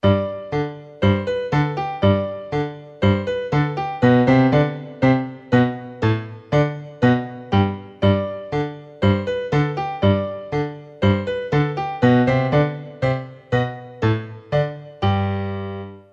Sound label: Musette